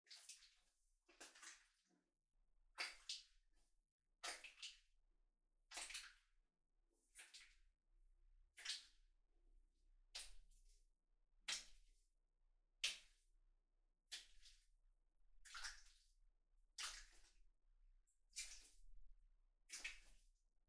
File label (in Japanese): ピストン 低速; 3DIO; アダルトな音